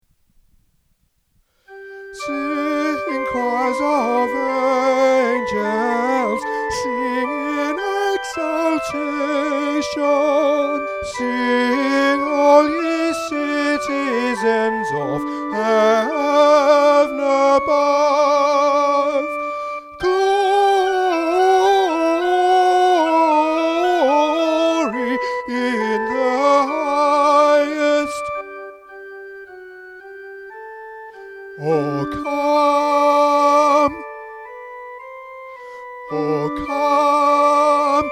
Sop Descant; O Come All Ye Faithful

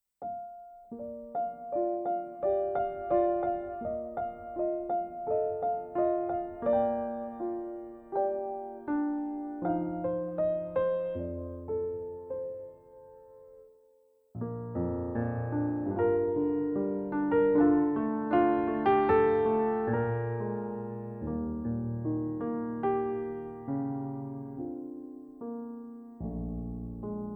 Baritone

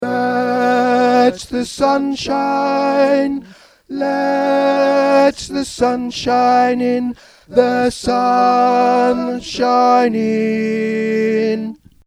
Alto Voice

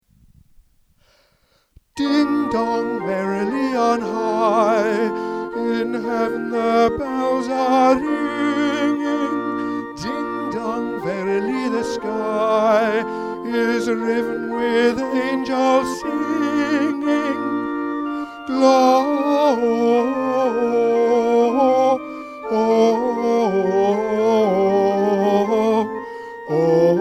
Tenor; Ding Dong Merrily

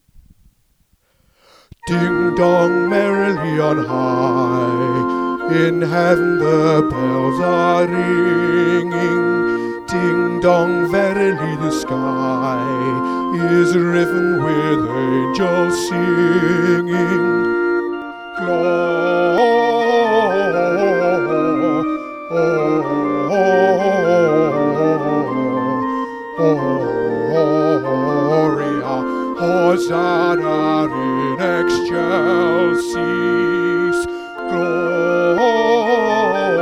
Alto, choir; Ding Dong Merrily

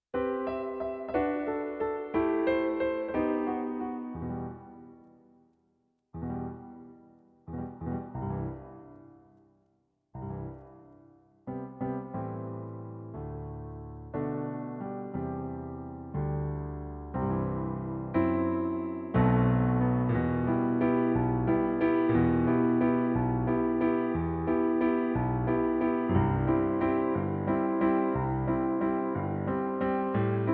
Piano Backing

